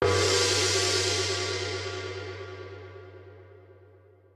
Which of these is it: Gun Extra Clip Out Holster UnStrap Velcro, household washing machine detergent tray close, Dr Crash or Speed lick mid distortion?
Dr Crash